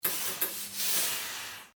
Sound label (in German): Dampfbuegelmaschine